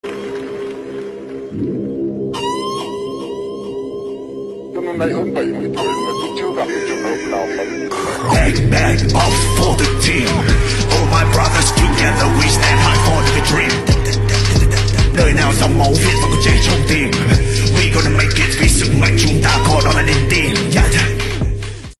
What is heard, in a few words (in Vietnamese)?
Rap Viet